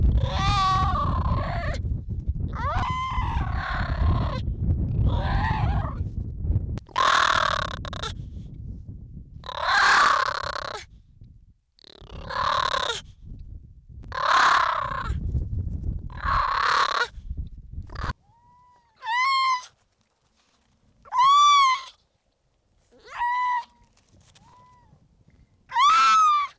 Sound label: call harp seal